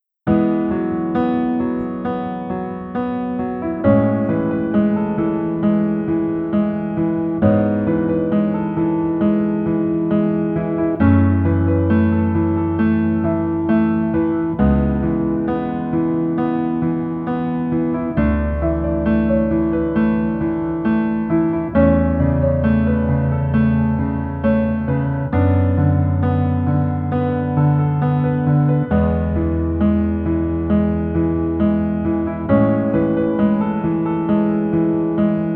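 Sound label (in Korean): Piano version 멜로디MR 남자키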